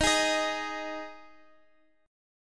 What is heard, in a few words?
G sharp 5th D sharp